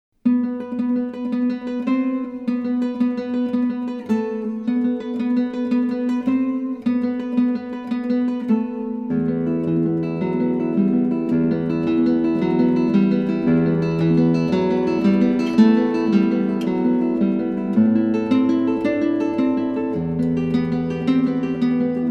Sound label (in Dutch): Gitaarboek